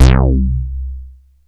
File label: synt, Juno-2; Giga Samples Collection; Bass